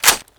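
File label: scarh bolt release; garrysmod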